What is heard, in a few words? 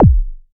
Roland SH-09 Bassdrum